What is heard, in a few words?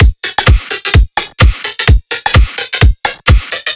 drum loop 128 Full; Anthemic